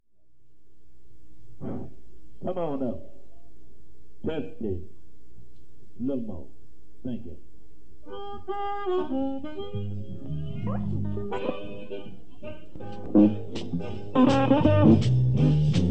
Instrumental

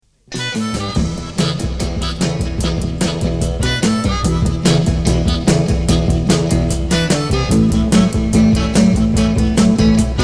Back Track Harmonica; RG Jones Studio Sessions

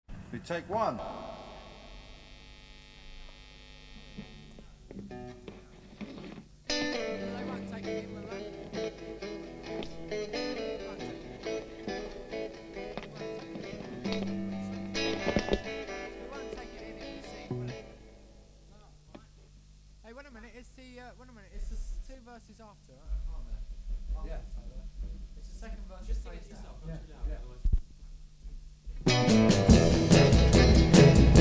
Backing Track; RG Jones Studio Sessions

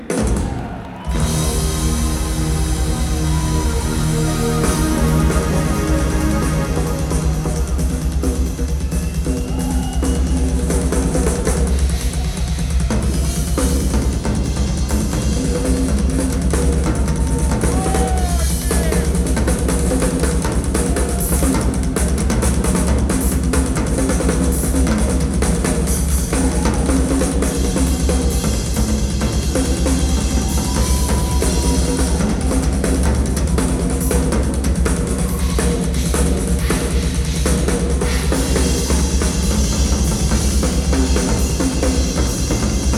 Drum solo

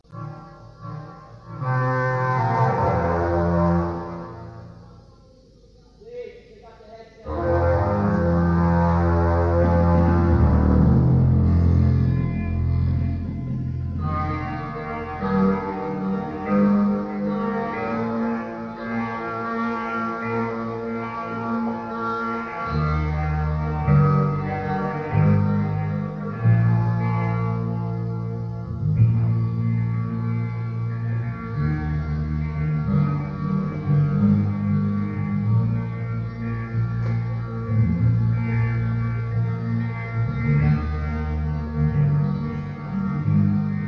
Instrumental Check

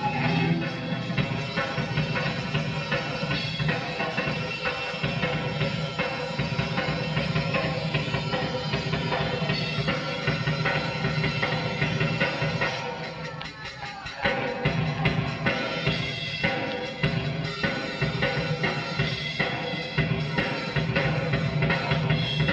Drums Solo; 1812 Overture

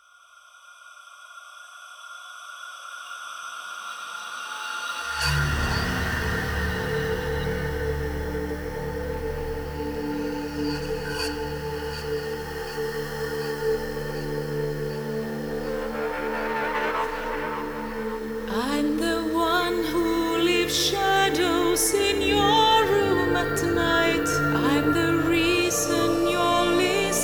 Ambience Sublow Mix

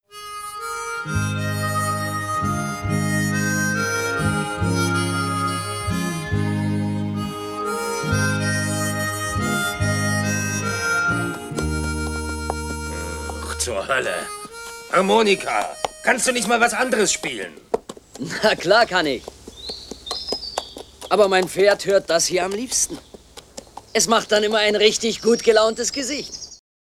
Harmonica; DEFA

